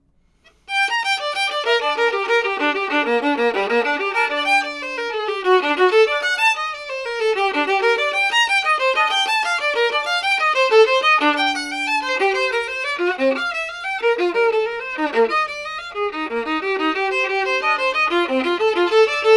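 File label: domra, Neumann TLM170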